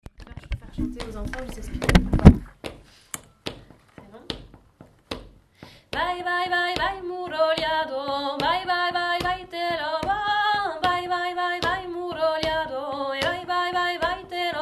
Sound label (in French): Bourree CHANT; rencontres-2014